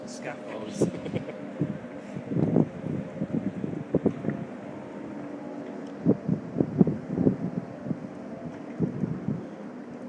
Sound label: sound of the wind coming through scaffolding by edinburgh castle